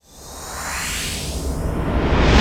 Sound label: BASS ACK, Trance Fusion, STOP SHORT; ILIO